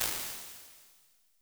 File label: Bright Hall, Impulse Responses; Medium Spaces; Halls; Apple